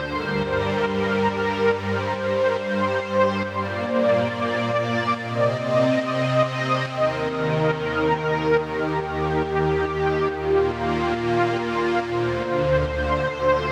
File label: Pad Main 140BPM, G minor; Melody & Synth; Vengeance Samples; VTS1 Devastating Truth Kit